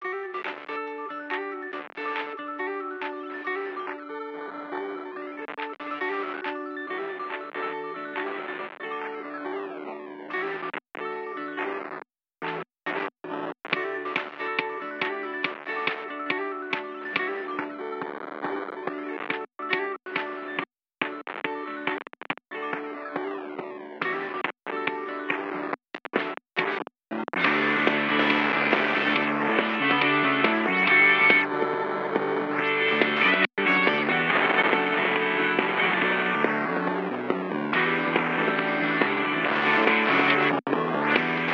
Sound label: bgm noise; story; 2halfanv binary planet noise